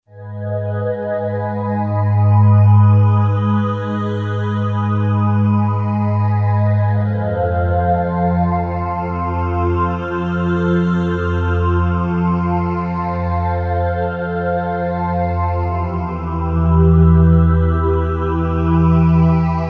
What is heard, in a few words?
Phase Pad; Roland SC-880; Factory Patches